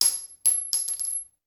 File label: Bullet Shell; Sound Ideas; Guns